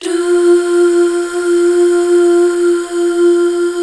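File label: Duh Female; Best Service Gigapack 1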